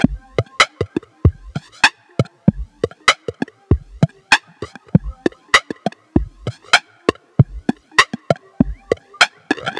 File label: Op1 drum loop; Vignette Kit